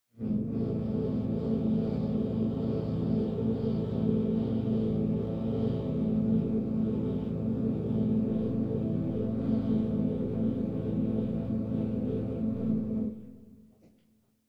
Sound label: Humming In A Minor